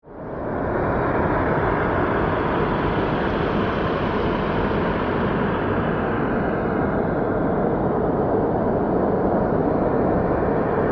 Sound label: Monster Lair, Horror, Monster, Lair; Monsters (Sound Effects:Horror); BlastWave